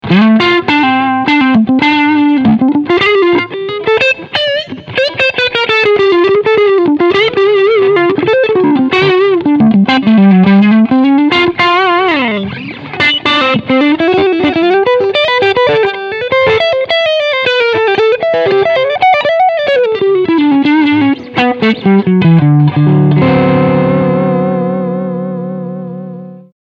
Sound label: AC Full Gain